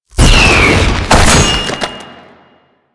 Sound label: Kamikaze Flesh, Proto Infested Volatile Runner; Warframe